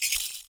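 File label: PERC, percussions; Univers Sons - Raricussions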